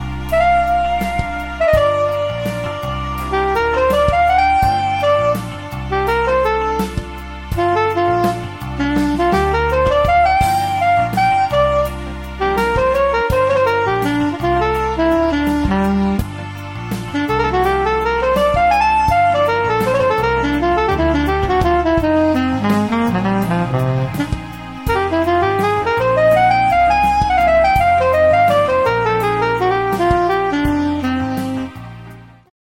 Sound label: Soprano Saxophone; Saxophus; syntheway